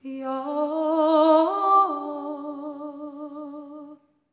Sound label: SERENE, Symphony of Voices; Spectrasonics